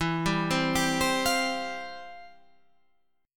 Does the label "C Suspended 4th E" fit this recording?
Yes